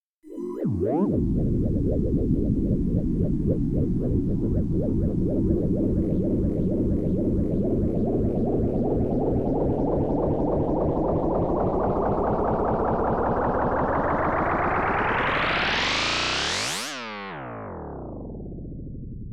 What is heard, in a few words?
Sci Fi Up An Downs